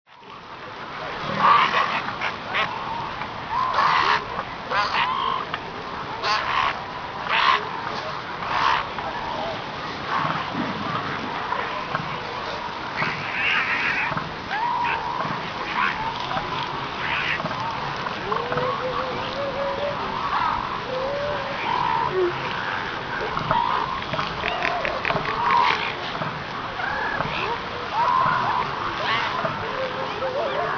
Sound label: fur seal herd